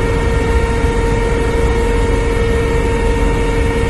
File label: inside cell medium stress loop mono left, Lynx internal audio loops